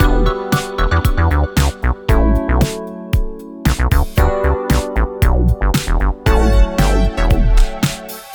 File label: six-trak lo fi, synth bass; synth bass center; audiolounge soft